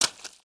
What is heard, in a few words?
Foot Swamp Water Run, footsteps